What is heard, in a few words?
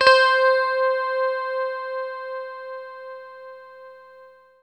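electric guitar C6; Greytsounds - Scott Peers Sound Engineering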